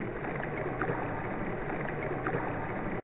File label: ambient water; Undertale